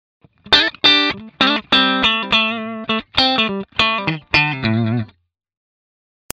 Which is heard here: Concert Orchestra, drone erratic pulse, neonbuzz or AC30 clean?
AC30 clean